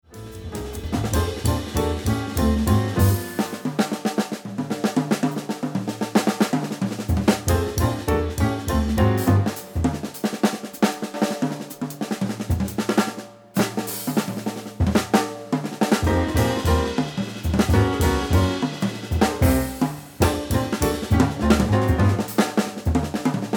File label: drum solo